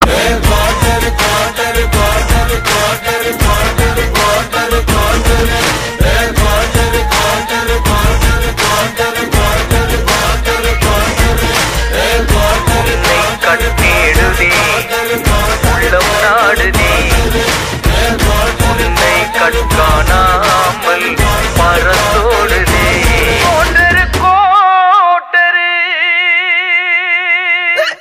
Theme

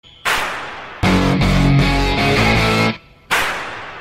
msg tone; Arjun reddy

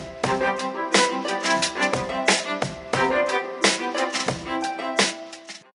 Message Tone

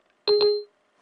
Siri Close

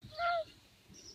meow meow meow; Lynx

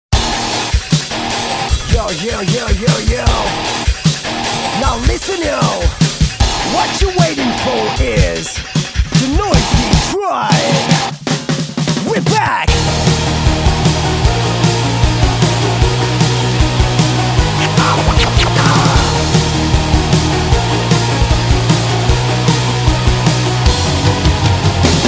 Noisy Tribe; Initiald

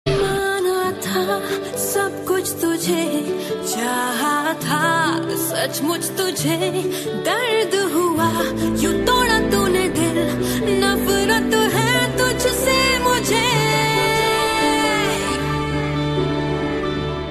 Best Ringtone

